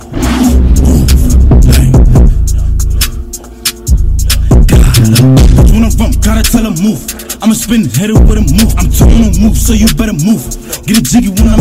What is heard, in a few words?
bass boosted